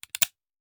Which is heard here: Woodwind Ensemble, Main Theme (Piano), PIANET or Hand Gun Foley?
Hand Gun Foley